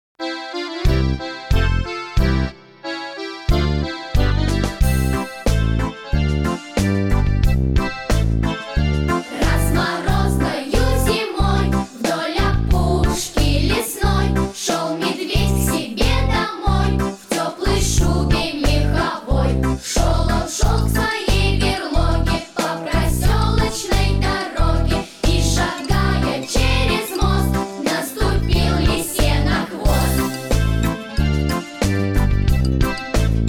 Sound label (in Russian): detskiy hor